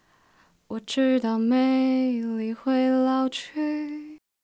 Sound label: Alto